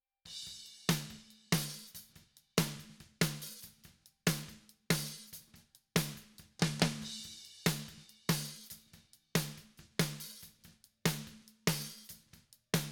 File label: snare